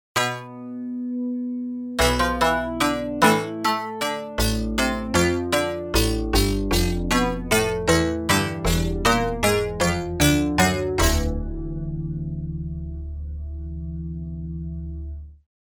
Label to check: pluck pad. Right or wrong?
Right